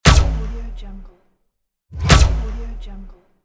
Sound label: blaster shot